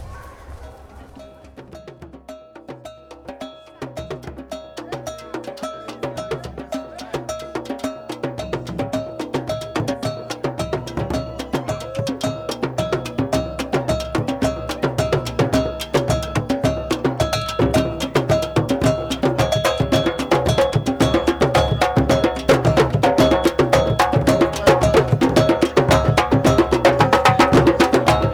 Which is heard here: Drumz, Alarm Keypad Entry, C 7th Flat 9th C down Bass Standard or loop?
Drumz